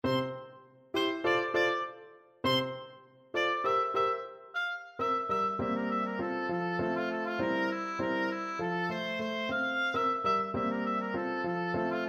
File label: pizzicato polka, oboe